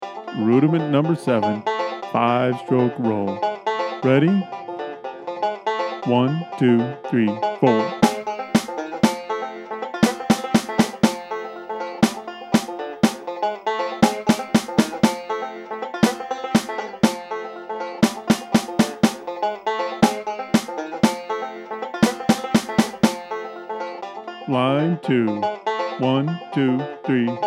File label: five stroke roll